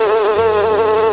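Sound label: bee, animal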